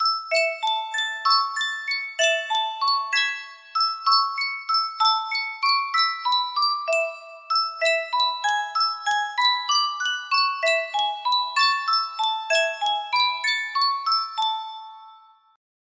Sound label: superstar music box